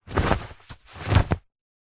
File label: foley clothing shorts thick cotton open pull inside out